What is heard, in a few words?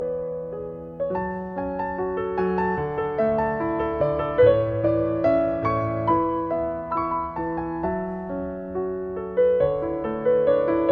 lullaby piano; twilight